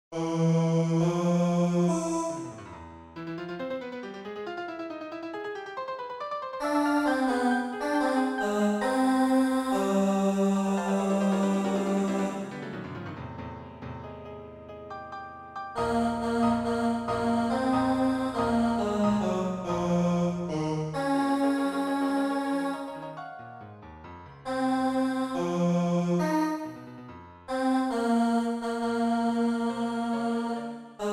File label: Bass